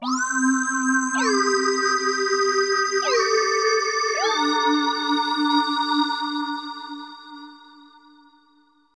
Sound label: SYNTH PAD AMBIENT 160 C, Ambient; eJay; Sphere